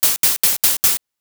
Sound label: saw